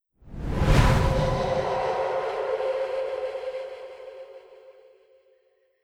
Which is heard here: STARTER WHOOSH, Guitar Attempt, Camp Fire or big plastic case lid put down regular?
Camp Fire